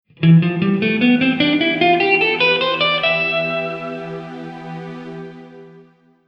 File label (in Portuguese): Escala de E Frigio